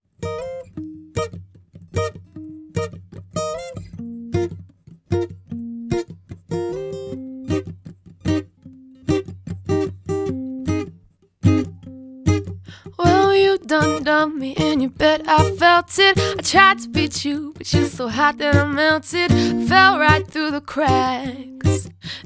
Acoustic Duo; London

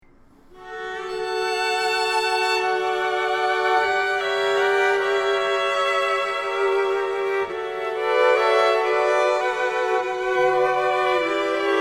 String Quartet; London

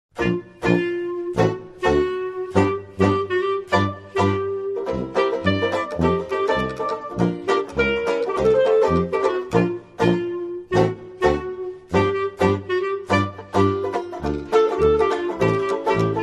Charleston Jazz Band

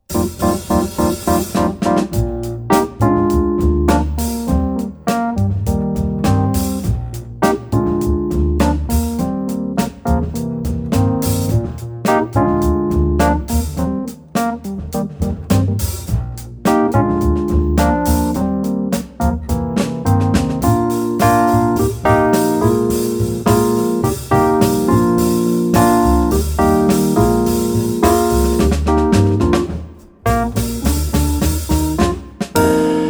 Jazz Trio; London

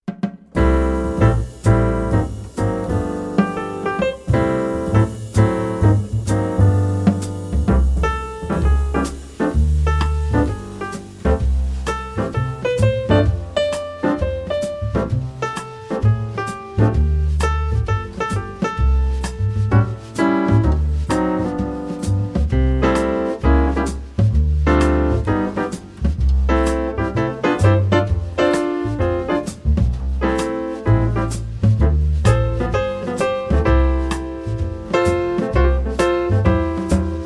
Jazz Trio; London

